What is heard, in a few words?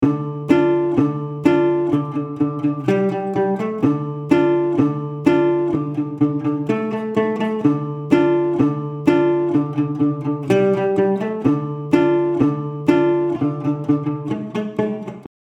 Steel guitar DRY